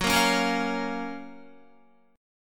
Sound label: Gb Major 7th Gb Guitar; GbM7 strummed; JGuitar